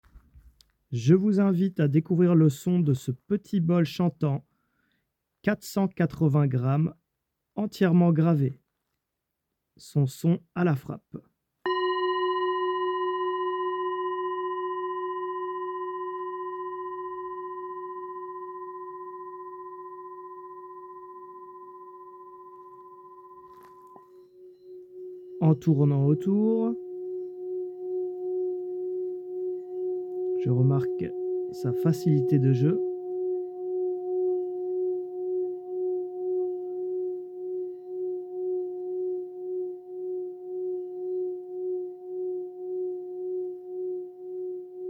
Bol Tibetain Grave Martele 7 Metaux Note Fa

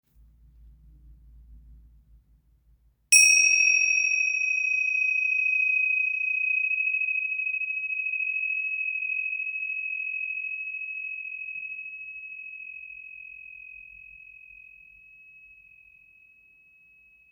Tingsha Note Re 8 cm de diametre

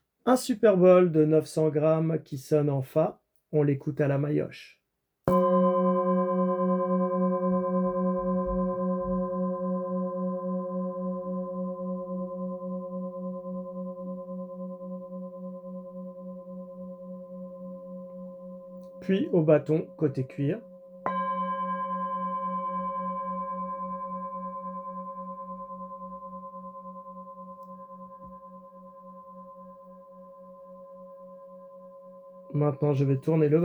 Bol Tibetain Grave Martele 7 Metaux Note Fa

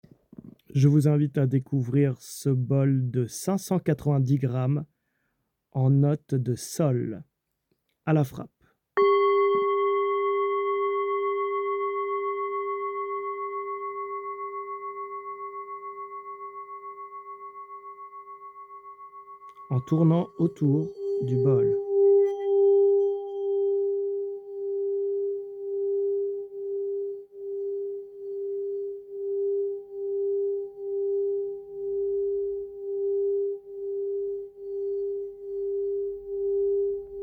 Bol Tibetain Grave Marteles 7 Metaux Note Sol

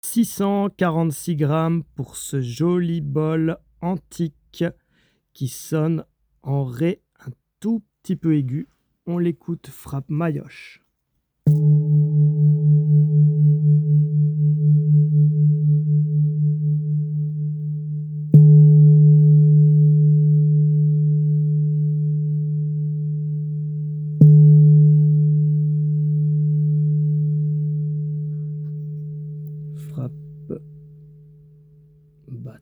Bol Tibetain Antique Note Re 18 cm

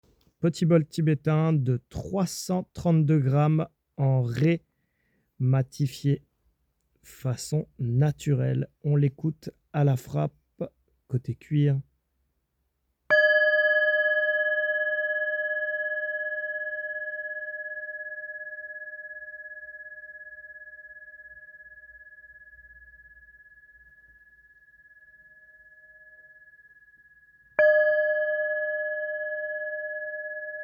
Bol Tibetain Martele Matifie Naturel 7 Metaux Note Re

